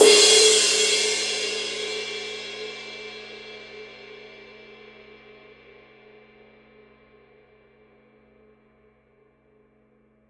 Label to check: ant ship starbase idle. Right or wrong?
Wrong